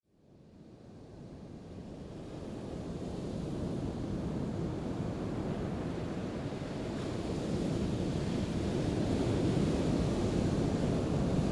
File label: ocean waves